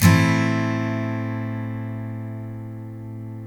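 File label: steel A minor down, CHORDS STEEL, Guitars; Spectrasonics; Hans Zimmer Guitars VOL-1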